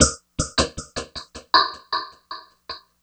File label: Drums Strange